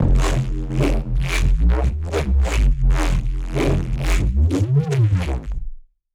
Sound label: F neuro 74bpm, bass